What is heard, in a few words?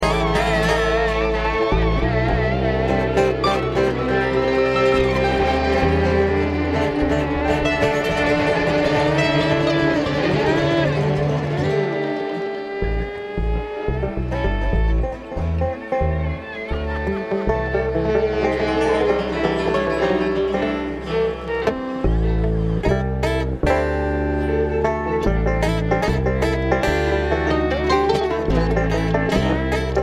Traffic Jam